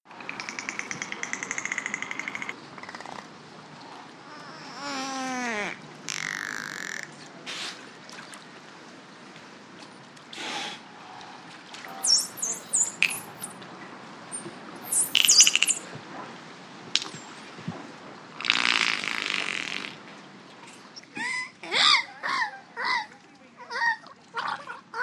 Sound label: ANIMALS SEA: Individual dolphin sounds, some voices in background.